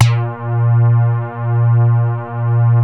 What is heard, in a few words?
CAT HARD B3, DIGITAL BASS; Music Store - Claudius Diemeter Schwerlos